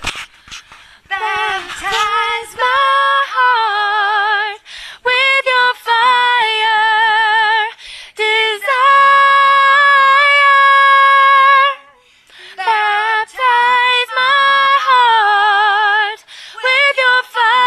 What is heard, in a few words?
baptize soprano